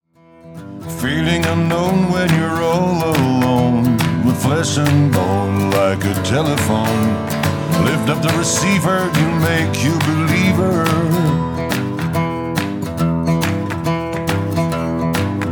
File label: guitar voix